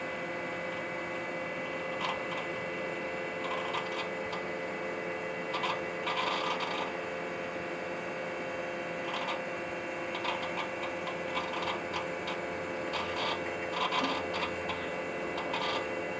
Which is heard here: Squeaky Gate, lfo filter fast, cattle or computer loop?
computer loop